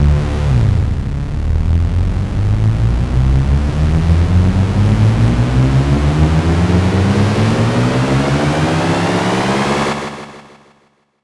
charge; undertale

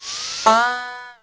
win; kids-tetris; Lab

